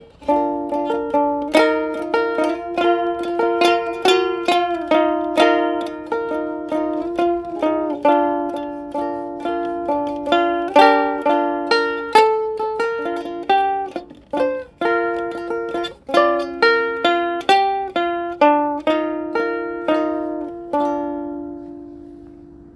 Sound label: Uke